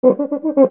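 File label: percussion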